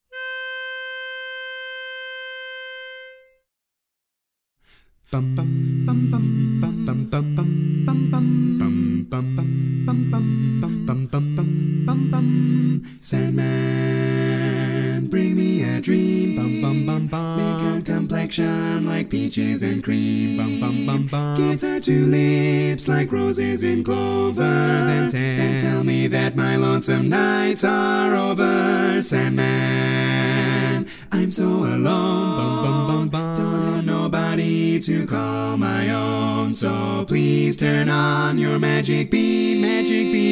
Lead missing

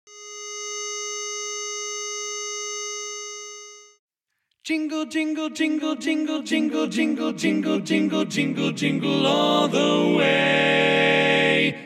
Jingle Bells Tenor

